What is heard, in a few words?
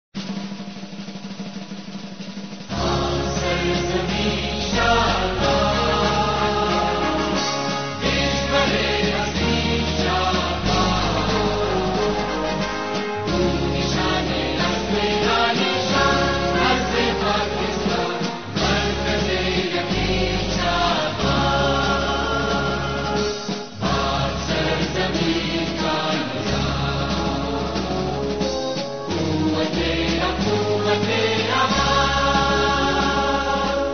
pakistan national anthem music